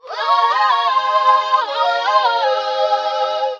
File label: voice filtered choir, loops, phonky